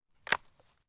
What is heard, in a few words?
impact tree branch snap